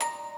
musicbox

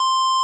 pico C5

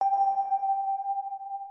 organ

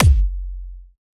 kick

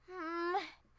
Hmm; Alice

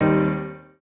piano

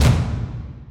drums

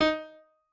piano

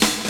breakbeat

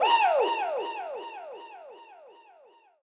vox

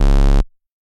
bass

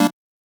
Chord

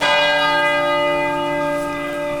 bell

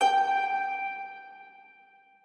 harp

